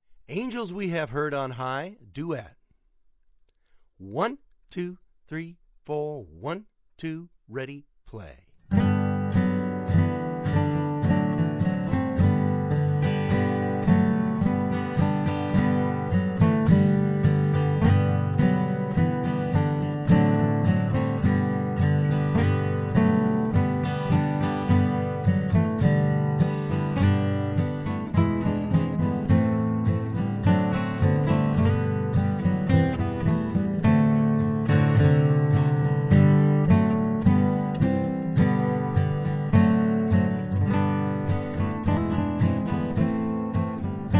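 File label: guitar duet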